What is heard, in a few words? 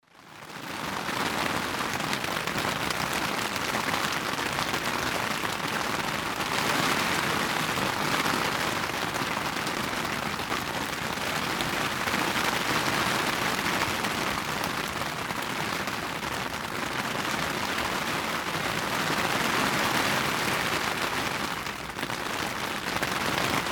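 pleasant rain sounds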